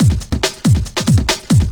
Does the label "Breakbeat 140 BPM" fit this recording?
Yes